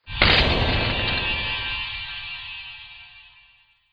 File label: Rock Debris Spell, Magic Sound Effects